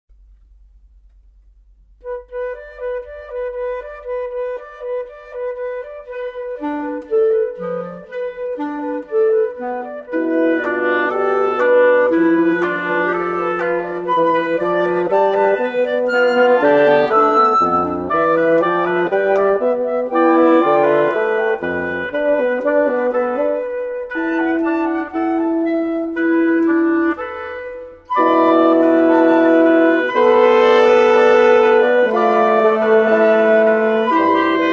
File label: Wind Quintet; 3 Movements For Wind Quintet